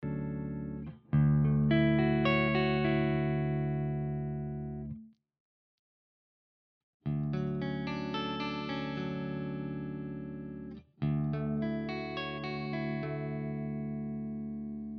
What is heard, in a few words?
RGT1270 clean all positions Arps